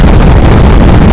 afterburner; KSP; Romfarer

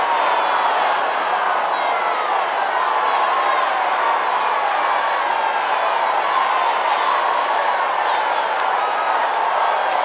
arena loop; Mount&Blade Warband

